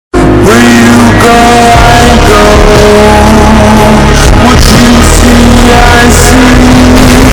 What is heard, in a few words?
Bass Boost